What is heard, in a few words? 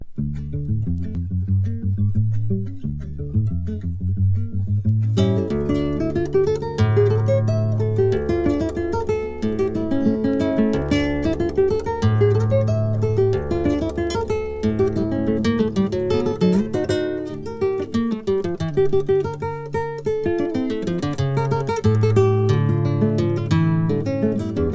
brazilian choro